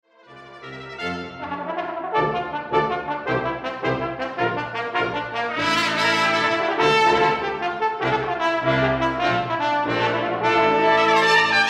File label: Son de Mexico, brass